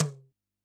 TABLA, PERC

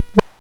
Breath, Jomox Xbase-09

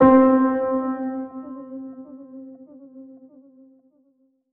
Piano 2 - C; 99Sounds; Monosounds; Music Loops; Instrument Oneshots

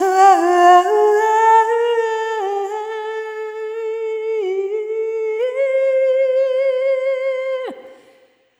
Arrhythmic F

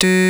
VOIC WV NT1 C5; Big Fish Audio; Synth City

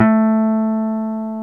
GTR, Jazz Guitar; Club-50 - Foundations; Jaz-Kessel

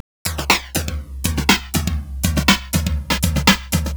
121 No Bd, Drum Loops 121bpm; Back2Basics; Nu Dance Electro

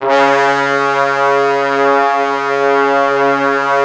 C#2, Orchestral Brass; Best Service ProSamples vol.20; AKAI

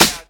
SD, dance drums hip

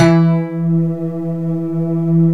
EVOLVING FM, SYNTHS; Kid Nepro; Elite

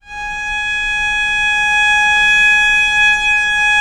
VL G#4, Historical Instruments, VIOLE; VIOLE 2V; AKAI; USB Soundscan vol.23

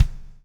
BLUES TK, Backbeat; Spectrasonics - Backbeat

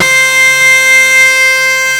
WAILING C5; East West - Global Sounds; DIRE LIKE

